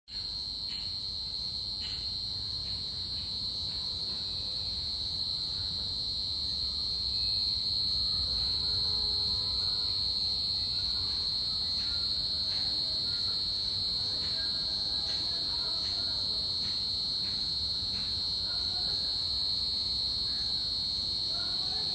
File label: jungle night, Forest Night Ambience